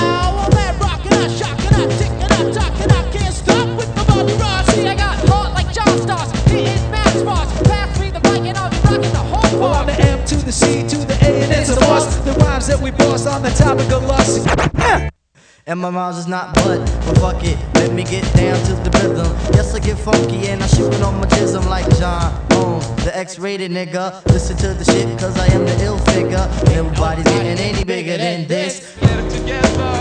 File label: hiphop